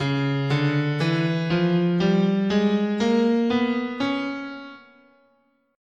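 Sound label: D flat Diminished Half Whole, guitar, scale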